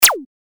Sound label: shot; ショット2; 全てシンセサイザーで制作したアナログ効果音です。